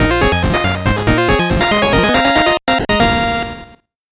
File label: 8bit jingle normal